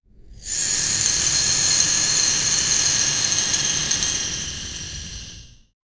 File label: very hot melted iron pour; industry